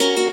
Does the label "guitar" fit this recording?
Yes